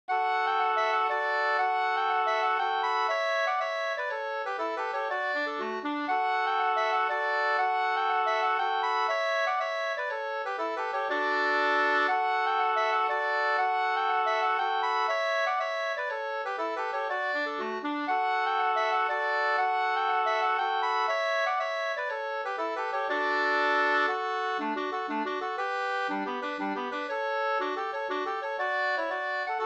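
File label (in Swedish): polska, tvåstämmig; Norra Rada Vr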